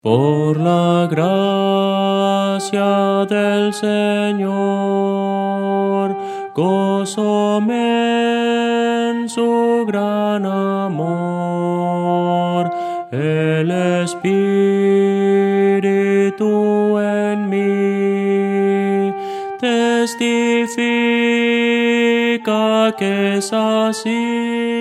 melodia, himnos cristianos

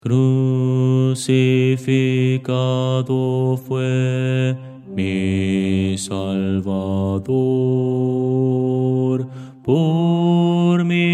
bajo

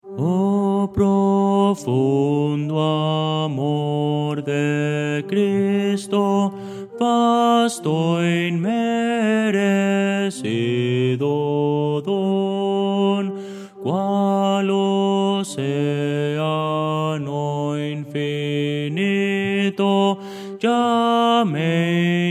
bajo, himnos cristianos; oh profundo amor de cristo

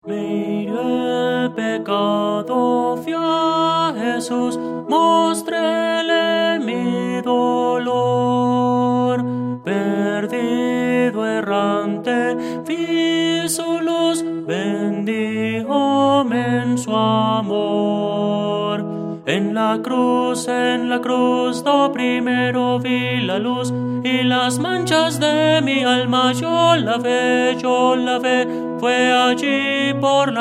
tenor

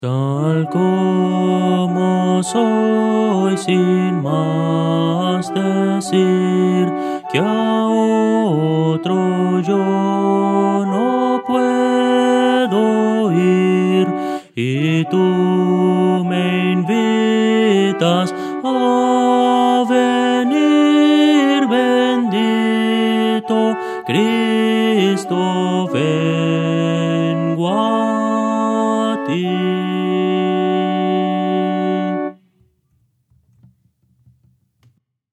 melodia

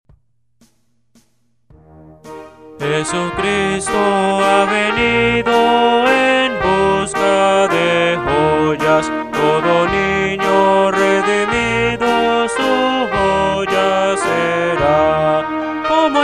Jesucristo ha venido, Himnos Cristianos

